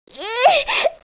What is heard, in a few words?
loop, weapons